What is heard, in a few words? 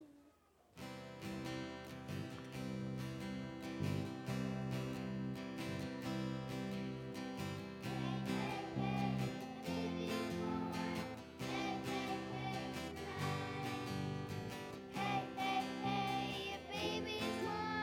Angels singing